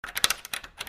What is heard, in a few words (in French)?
son cles